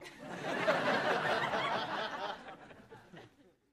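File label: Crowd 100 Laugh Reaction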